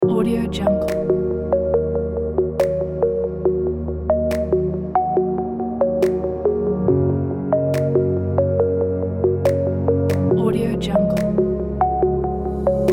atmospheric chill; abellsound

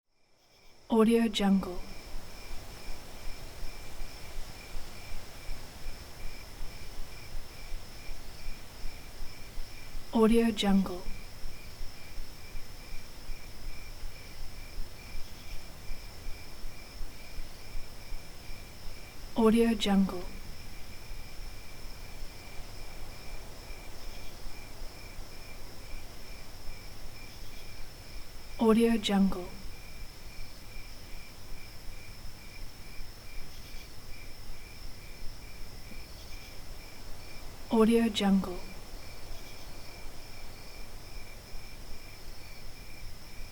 evening nature

